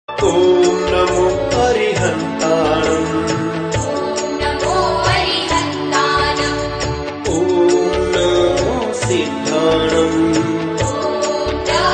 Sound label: mantra namokar